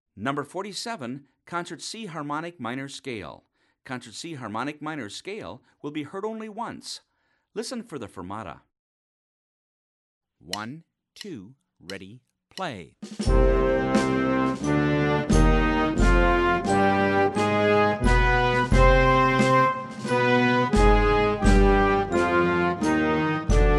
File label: concert c harmonic minor